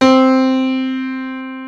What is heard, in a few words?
U-20 PIANO; Rubber Chicken Software Sample Bank